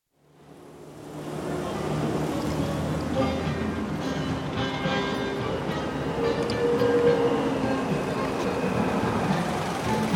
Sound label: Streets Turkey, Istanbul, street music, with passing traffic and voices; City; BBC